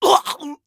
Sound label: scav death, voice; Escape from Tarkov; scav1